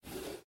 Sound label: Drawer Out; Granny 3